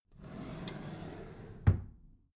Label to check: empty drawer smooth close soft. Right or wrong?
Right